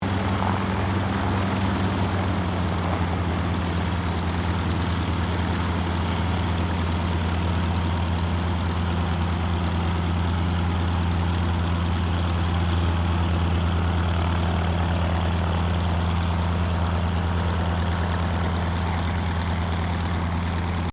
1977 YAKOVLEV YAK-52 SQUADRON TAXI, Russian Yak 52 Training aircraft; Airplanes; Vehicles